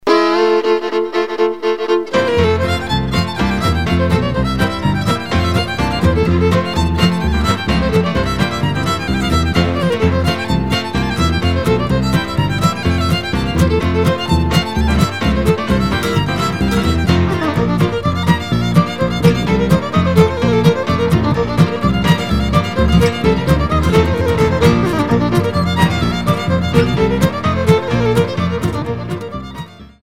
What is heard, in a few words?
Hornpipe